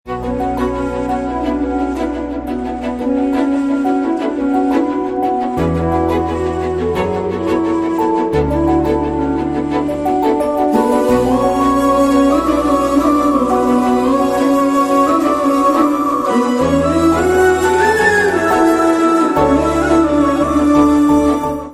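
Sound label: background music, Telugu Ringtone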